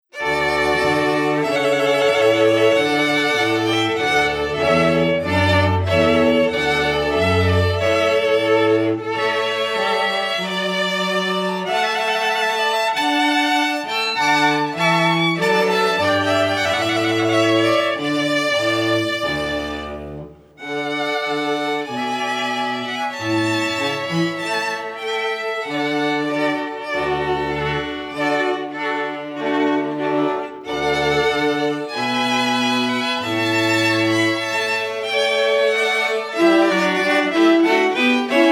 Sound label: TRUMPET VOLUNTARY, string quartet